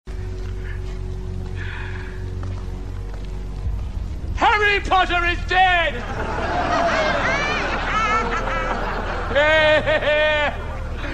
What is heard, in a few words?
ehhahheh laugh; harry potter is dead; voldemort